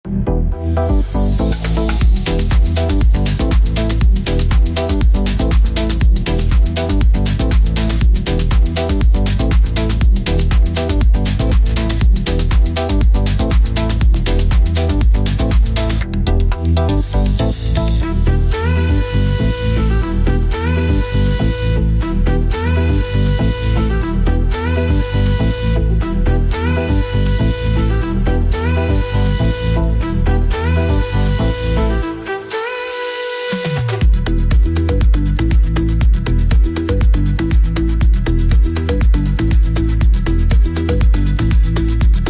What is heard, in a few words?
Fast-Paced Latin House Jackin House